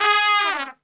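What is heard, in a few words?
G#2 trumpet, Brass Super Section; Best Service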